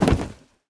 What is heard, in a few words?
Box Jump; MiSide